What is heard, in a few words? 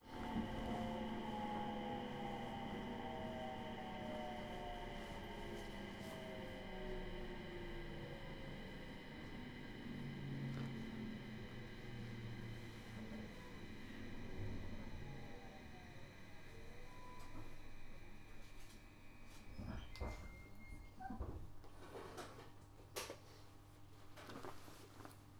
jr tohoku line minami fukushima station